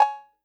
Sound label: Perc Wide Cowbell, 808; 808 Percs; Slate; Vintage Drum Machines Volume 2 808 Edition